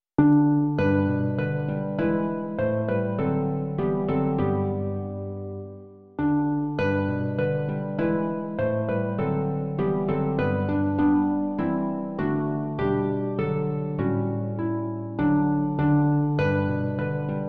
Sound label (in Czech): harfové duo; Hned v jitře k tobě duši svou; Imaginativní verze